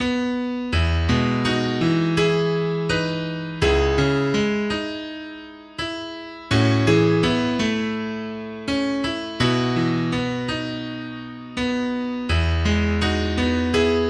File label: Piano Solo